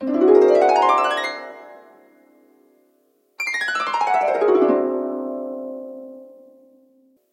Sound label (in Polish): Harfa